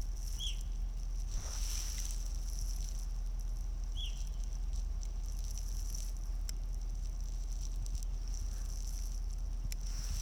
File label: Hermit Thrush, Catharus guttatus, Aves